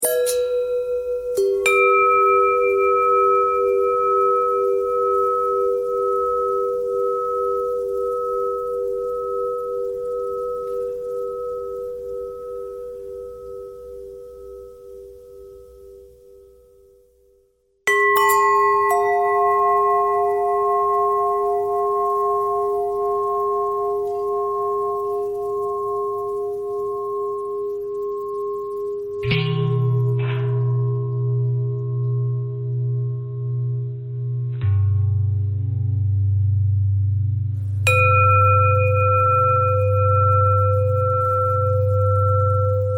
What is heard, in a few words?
bell long bell threads; bells